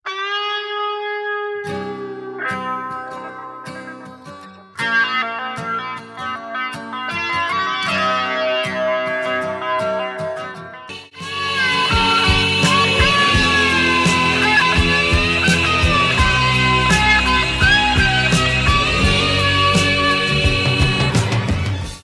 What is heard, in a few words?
leslie